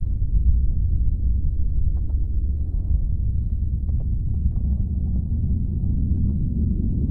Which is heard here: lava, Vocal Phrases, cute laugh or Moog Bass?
lava